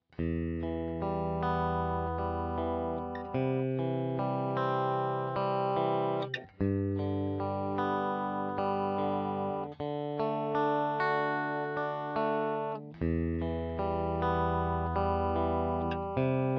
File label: strat